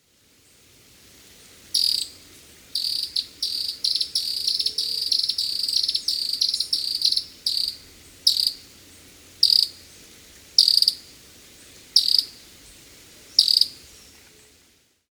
ferreirinho relogio

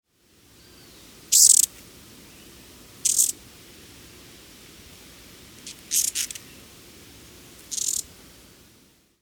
besourinho de bico vermelho

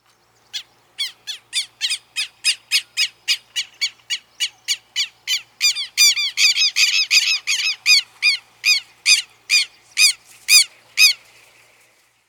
quero quero

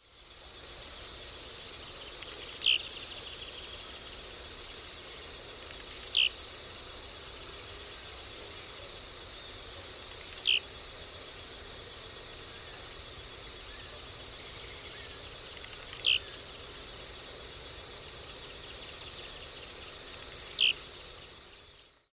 tiziu

